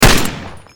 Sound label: Deagle